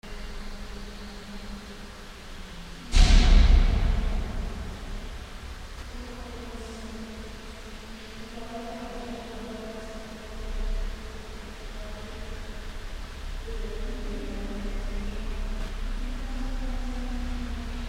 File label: rustic long corridor